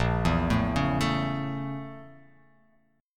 Bb Minor Major 7th Flat 5th; BbmM7b5; arpeggiated; x,1,2,2,1,4,x,x; JGuitar

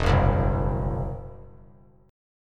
E Suspended 2nd Sharp 5th, Esus2#5 chord; strummed

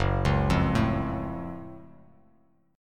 Gb Minor Major 7th Gb down Bass Standard; arpeggiated; JGuitar

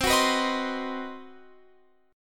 C Minor Major 7th Flat 5th chord, Guitar Open F; CmM7b5; strummed

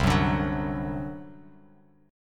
C Suspended 2nd Flat 5th C down Bass Standard; Csus2b5 strummed

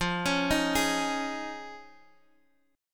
F Minor 7th Sharp 5th, chord; x,x,x,x,5,8,5,5; down; chord shape

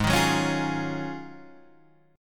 Ab 13th Suspended 4th Ab; strummed; JGuitar

